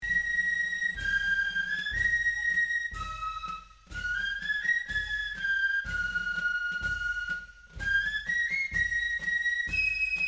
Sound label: Drum Fife; Handel; See the conquering hero